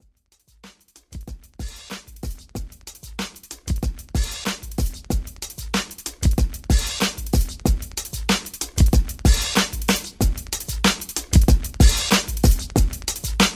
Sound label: R B Re Drum 94 BPM